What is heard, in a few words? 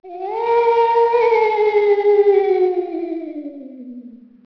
Ghostly Wail